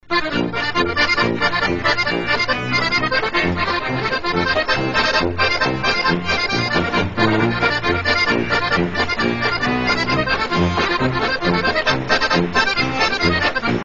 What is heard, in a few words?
Clarinet Chord Progression